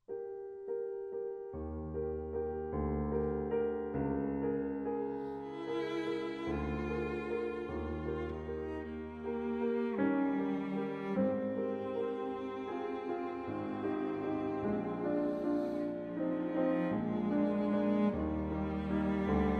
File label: piano trio; dubois